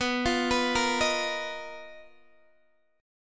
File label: B Major 7th Flat 5th B down Guitar DADGAD